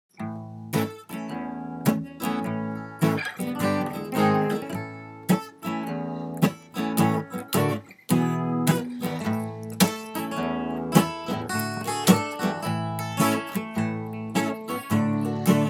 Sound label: no vocals